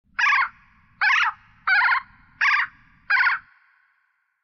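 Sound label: haopterus, flying dinosaurs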